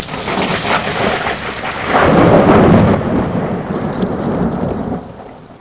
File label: thunder rain